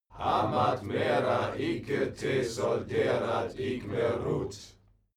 male crowd evoke

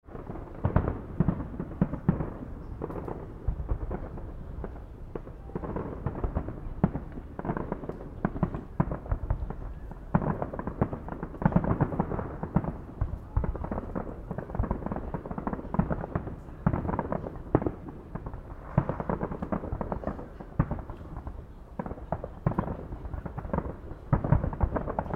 fireworks atmo

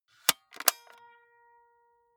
walkman close clink

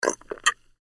beer can

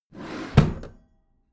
packed drawer smooth close heavy

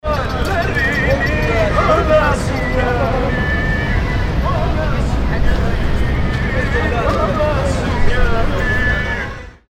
black market atmo

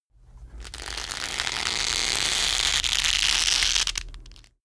leather creak

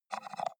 computer mouse wheel scroll fast

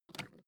suitcase lift up soft

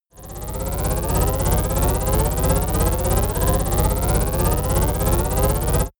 shuttle engine start

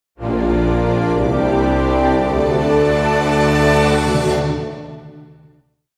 orchestral ending

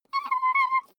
child play

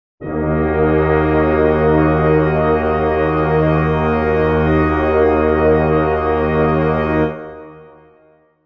brass chord d sharp minor

